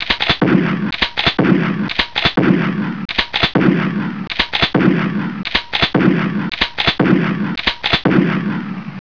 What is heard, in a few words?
shotgun